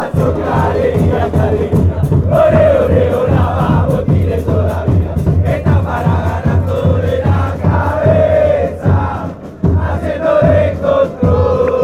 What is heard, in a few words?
so much joy so much joy, chants